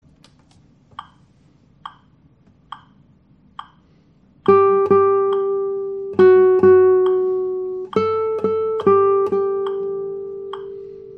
nuit daout melodie; repiquage de la mélodie; prempière partie

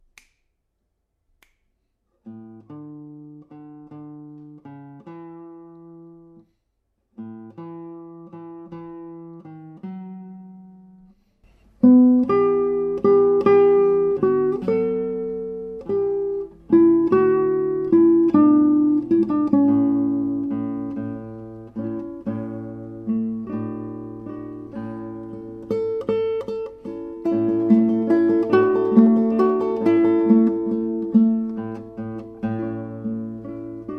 intro et theme Guitares

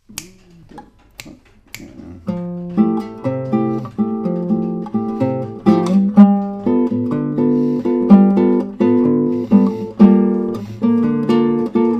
accomp avec pluie

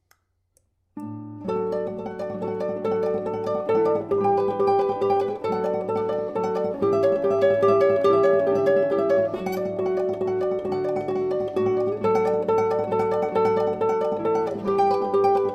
variation4 guit

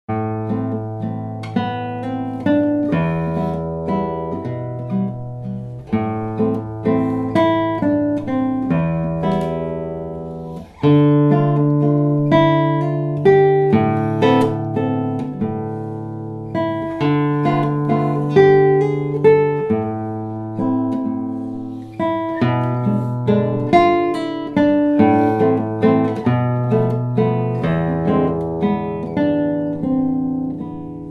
valse slave

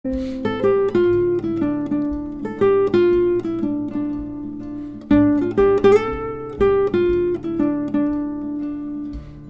melodie refrain

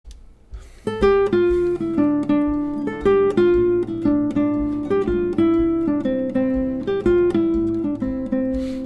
melodie refrain; première moitié

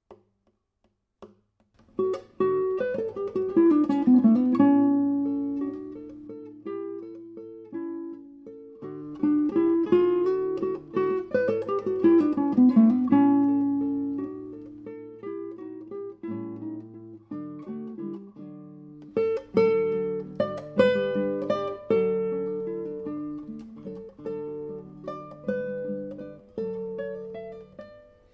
variation1 guitares

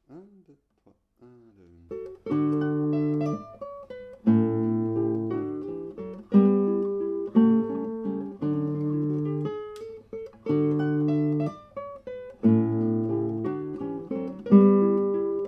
variation3 guitares

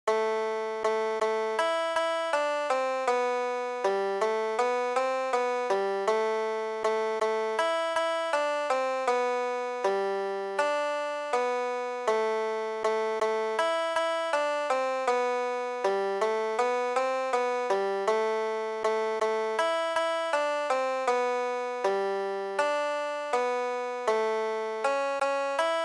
bigouden melodie lente

